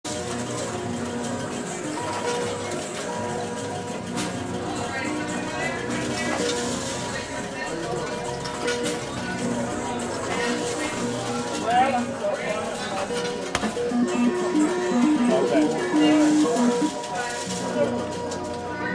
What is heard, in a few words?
Day at the Arcade; Blastwave FX